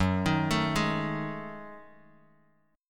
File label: Gb Minor 6th Add 9th Gb Bass Standard; arpeggiated; JGuitar